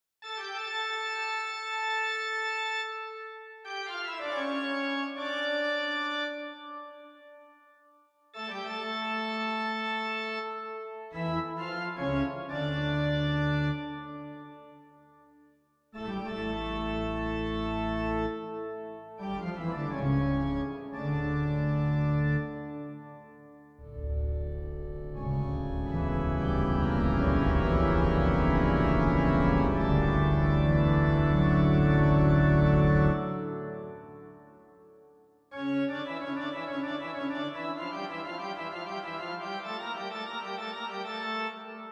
Toccata And Fugue In D Minor Bwv 565

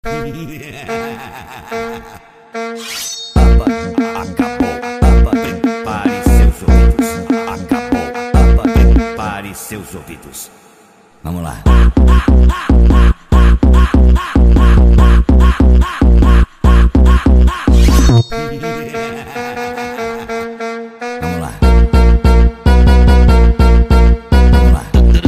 Brazilian Phonk Speed Up

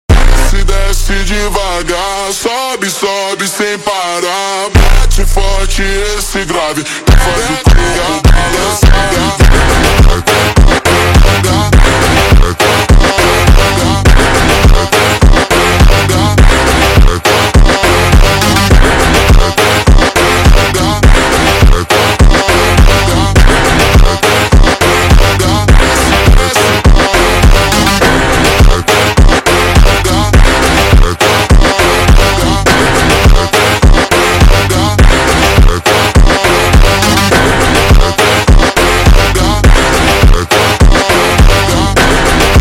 MONTAGEM SUPER SLOWED

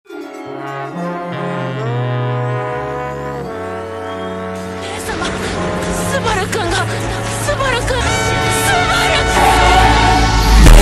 Funk super Slowed

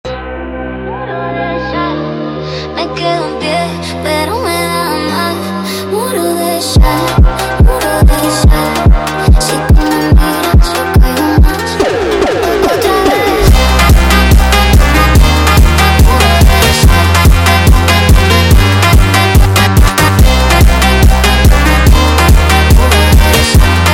Jumpstyle sped Up

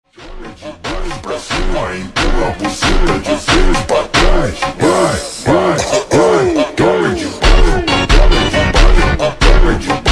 Phonk Slowed

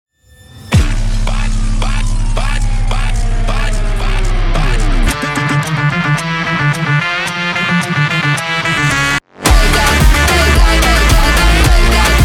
Montagem rock Version

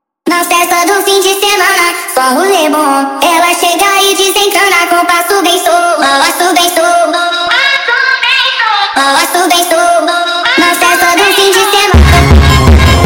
Brazilian Phonk speed Up speed Up

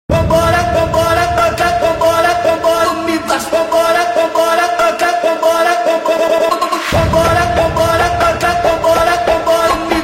Funk sped Up Reverb

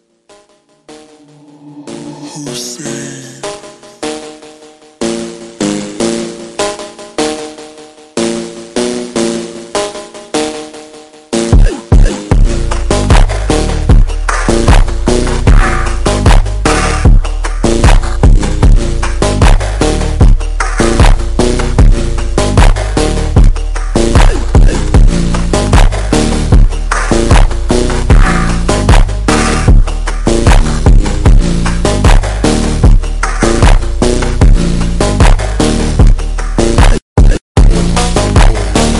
ultra Slowed

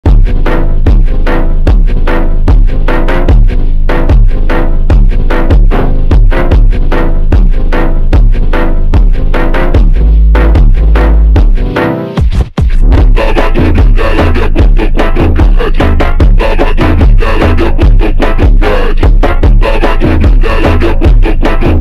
Phonk Slowed

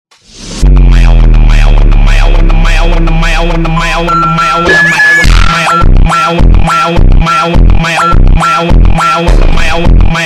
Funk super Slowed

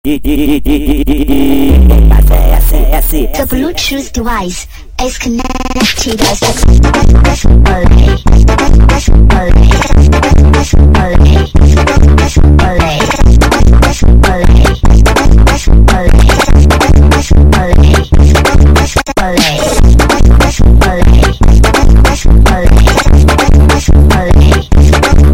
Funk sped Up

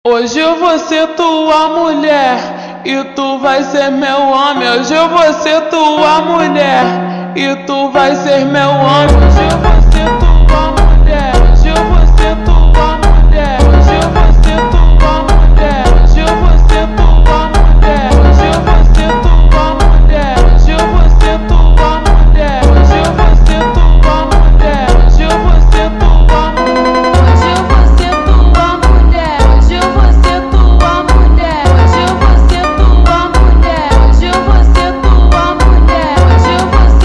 Funk slowed

